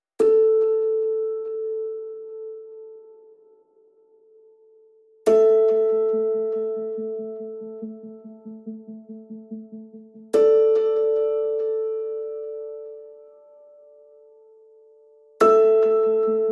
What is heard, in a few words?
Pianos Only